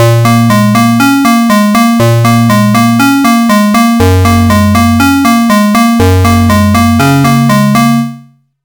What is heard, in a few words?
bass; juce